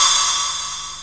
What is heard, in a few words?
HI RIDE, CYMBALS